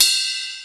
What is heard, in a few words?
Ride, EMU PX-7 Drum Machines